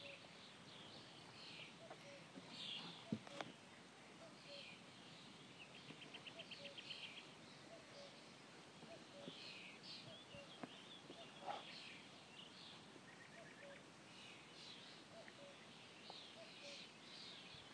Kuckuck

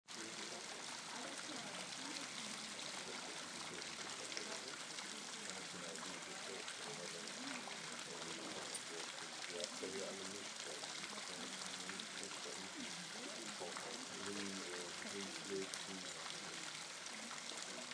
Urlaubsgeräusch

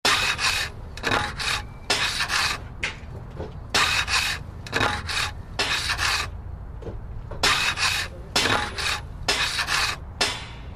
baustellenbeats

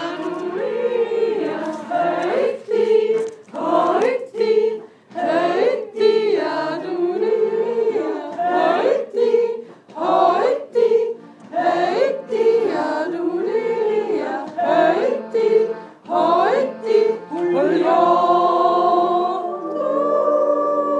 der erste gelernte jodler beim jodelseminar

